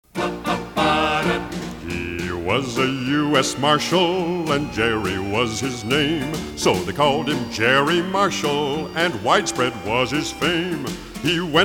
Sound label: Ballad (N.Y. Vocals); WMGM; PAMS; Six Man